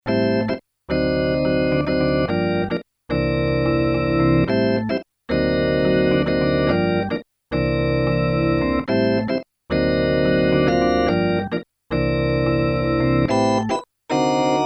yamaha tg33; cheap soul organ

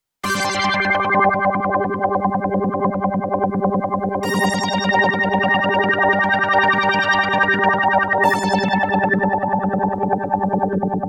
synth mov, Kawai sx240

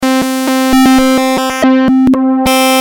pcm, roland jd800

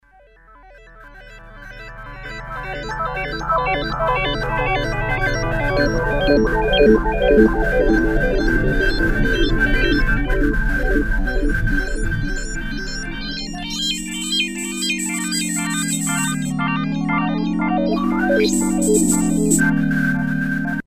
korg z1; synth

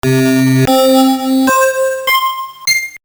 amiga Jahr Markt; commodore amiga

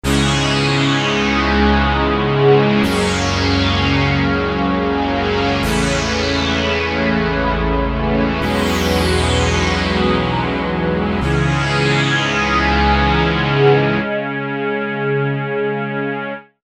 cs pad, yamaha cs1x cs2x

